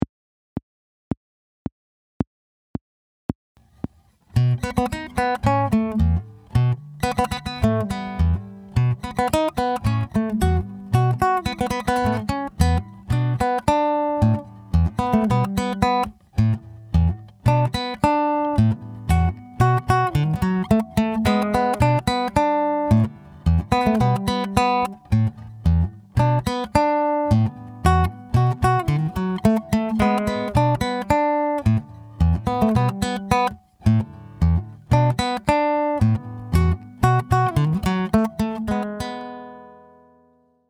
arrangiamento fingerstyle

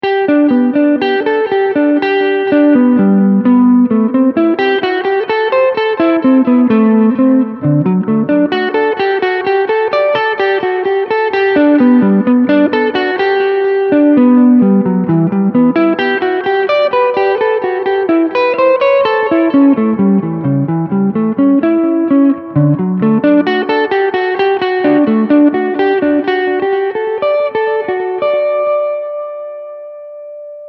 arpeggi VII posizione

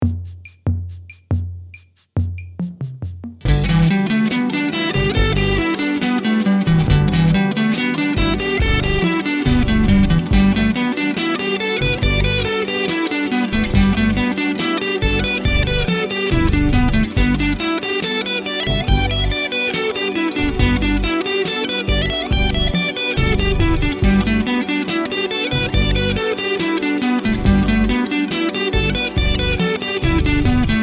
esercizio 4 - 70bpm, guitar

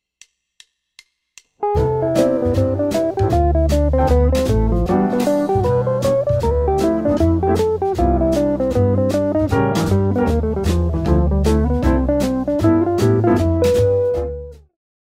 assolo jazz style, guitar

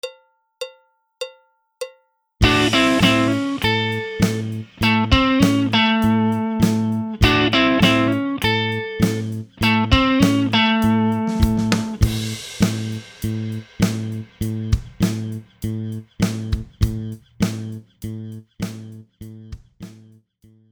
Esercizio, guitar

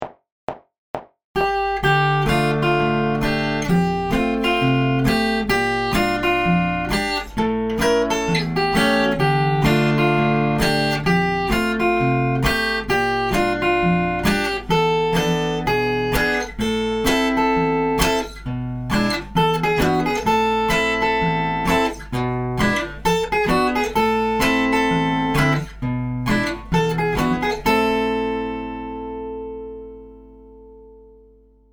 ritornello in fingerstyle, guitar

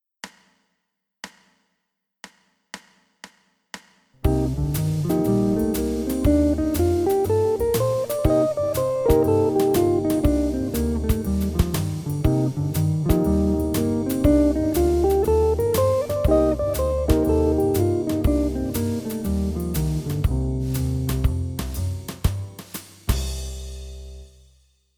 Box modo dorico, guitar

